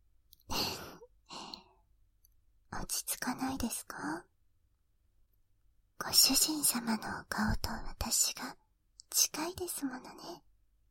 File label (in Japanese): 左のお耳もふわふわ